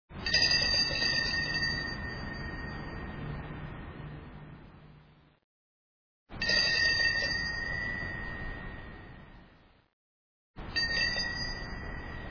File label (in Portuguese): sinos